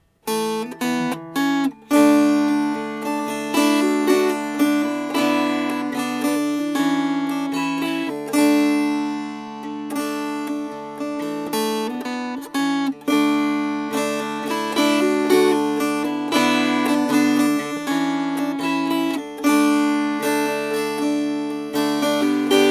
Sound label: Strummed